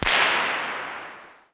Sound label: pop; Tanks